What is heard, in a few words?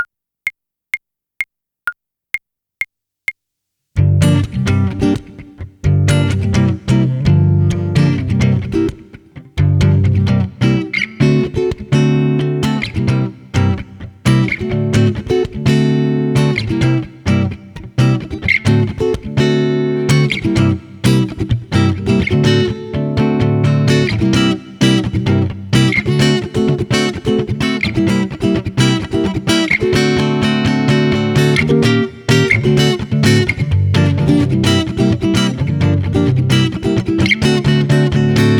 Tick Tock (Instrumental); Mini Musical Tales